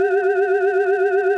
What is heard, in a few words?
Sci-fi Hover Bot Loop; SFX Kit; Sci-Fi Loops